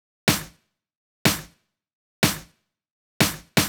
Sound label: Big EDM Clap Loop, 123 BPM; Future House Tools; Big EDM Drum Loops